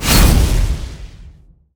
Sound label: Crash; MUDevs S20.1-3